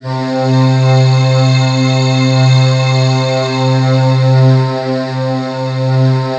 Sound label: SPACE PAD C3; EdgeSounds; Drum Mashines VOL-1